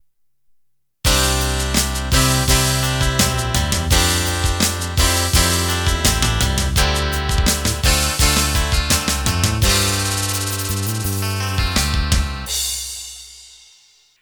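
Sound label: Rock Ballad Intro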